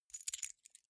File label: key ring small grab